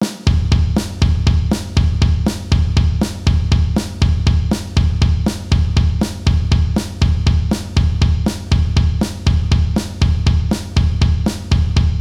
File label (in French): double coup grosse caisse, batteur